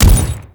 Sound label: Ghoul Devourer Step Default, Footsteps